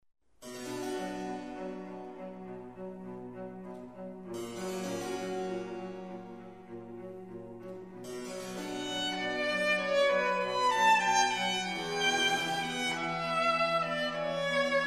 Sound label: Concerto for violin in D major; Antonio Vivaldi